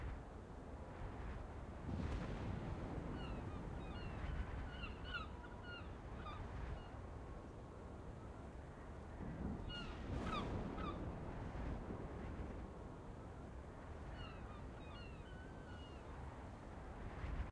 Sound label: ambience loop; tft set9